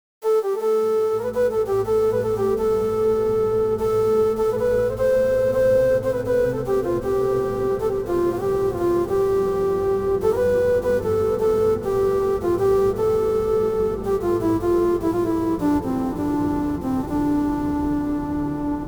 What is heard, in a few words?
latin guitar flute